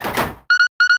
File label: lever blip